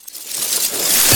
casting charge matter grow, Magic and Spell Sounds